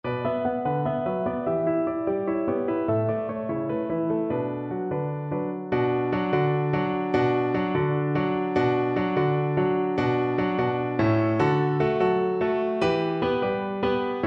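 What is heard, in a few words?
VLC karaoke, Cello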